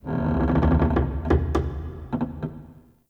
Cave Mine Wood Beam Creak; Ambience; Midrath Island

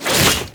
Inf Transform Claws Whoosh, Infested; Warframe; Weapons

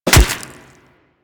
Melee Staff Impact Flesh

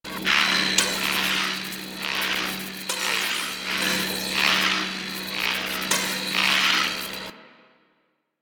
Glowing Egg Big Loop; Props; Warframe; Entrati

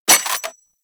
Burst Rifle Mech Secondary; Weapons; Grineer

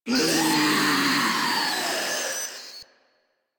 Proto Infested Ancient Death, Enemies Vocals

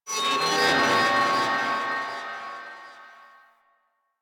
Mid Texture; Warframe; Quests; TheNewWar; Ambience; Zariman

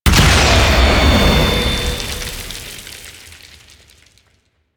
Orbital Strike Explosion; Abilities; Warframe; Veilbreaker; Quests; Kahl

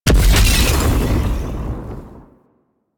Throwable Coolant Item Explosion; Ambience; Warframe; Venus Open World

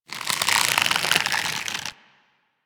Nunchaku Finisher Tension; Warframe; Tenno

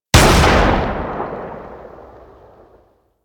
Laser Aim Rifle Shot, Weapons; Grineer

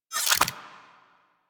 Warfan Unequip; Warframe; Weapons; Melee

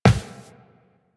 Run Default, Footsteps; Warframe; Tenno

